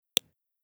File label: scale